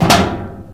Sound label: metal grate, footsteps, infected, walk; player; source engine; left 4 dead 2